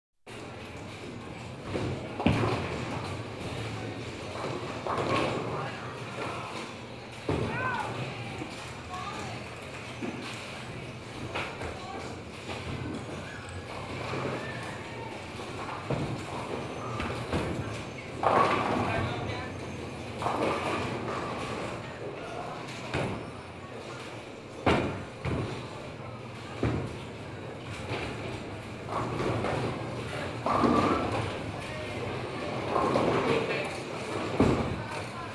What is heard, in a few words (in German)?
kegelbahn; Sport